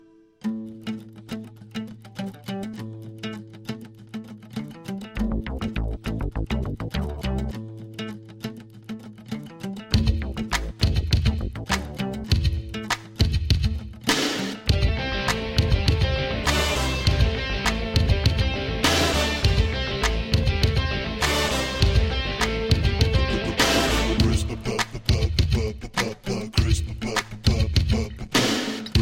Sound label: Beat, Indian